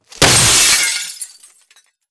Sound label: glass break close; corporate; Titanfall 2